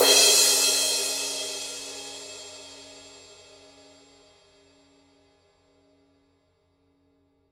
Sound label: Paiste 2002 18 crash edge